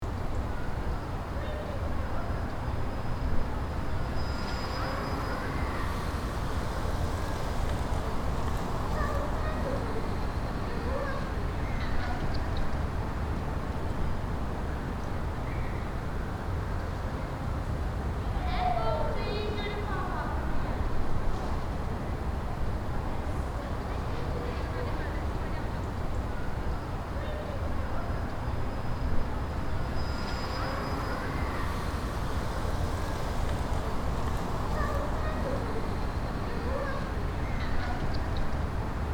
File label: School playground courtyard empty